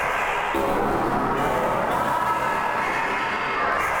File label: Tonal Re Draw, sparse soundscape